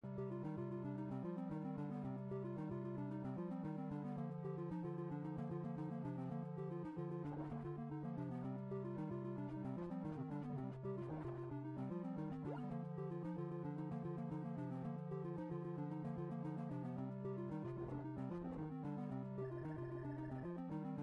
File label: Vampire game gg; Zomboid; Video Game Consoles